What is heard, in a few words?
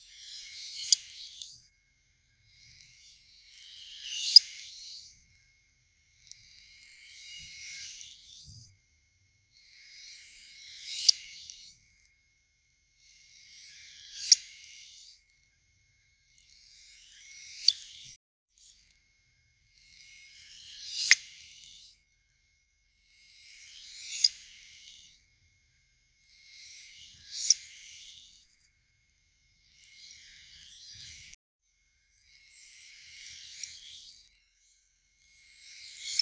close mic asmr sound of a, cutting